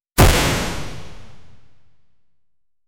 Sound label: a clean sharp and skillfu, sword